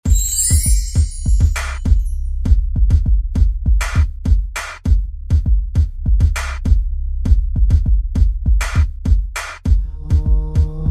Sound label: crank track